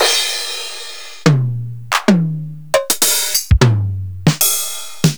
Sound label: Hits TR707